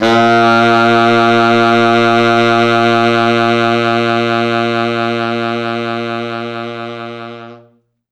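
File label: T Sax F#1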